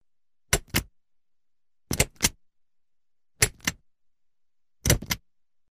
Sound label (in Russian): zvuki viklyuchatelya sveta shelkaet knopka pereklyuchatel